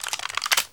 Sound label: Swish Weapon Pistol Spin, Weapons Foley; SFX Kit